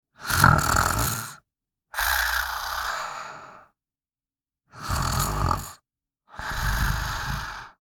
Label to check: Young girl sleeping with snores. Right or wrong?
Right